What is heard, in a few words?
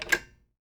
phone receiver button